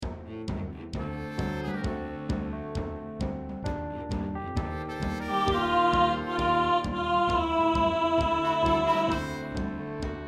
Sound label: Tenor, rehearsal